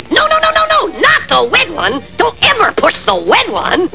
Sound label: button